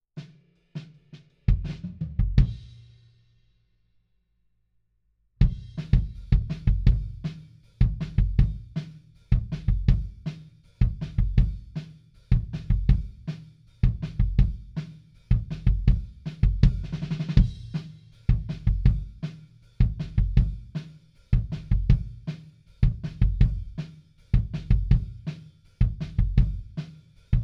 Kick In, 160 BPM

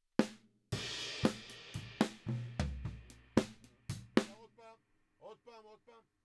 Drums

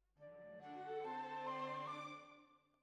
Bassoon, Jazz for piano

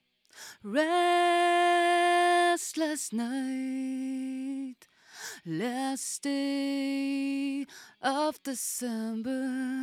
Vocal

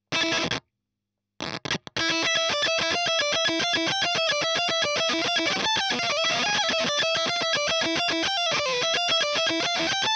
EL Fuzz End

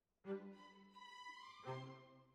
Cellos

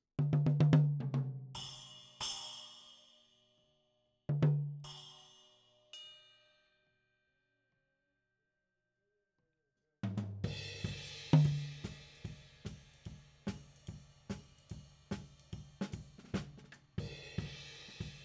Tom

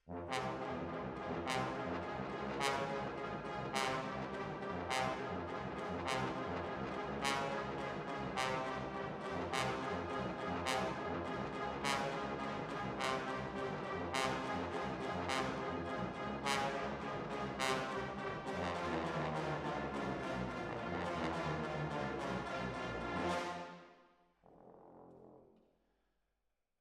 Hall, Jazz for piano

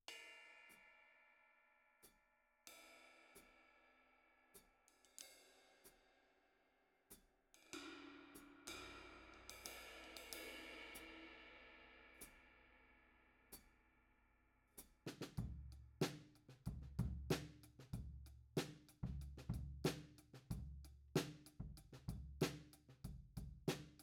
Floor, 94bpm

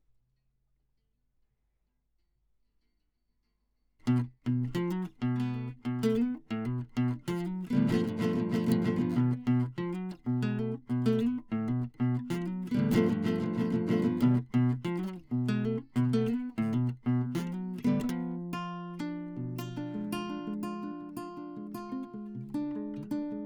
Classic Guitar

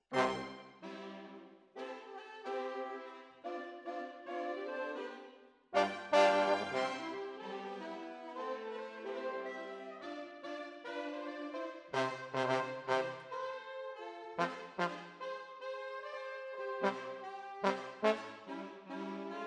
Trombones, jazz for piano

